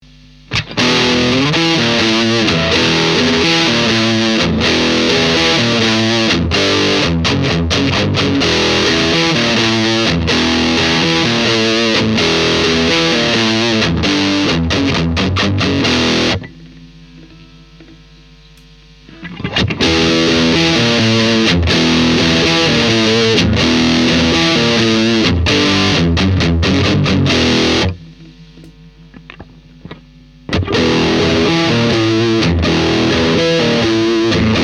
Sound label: Peavey Di Box Drive, SM57